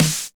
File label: Snares; Vengeance - Essential Clubsounds Vol.4